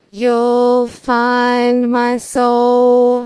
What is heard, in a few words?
singing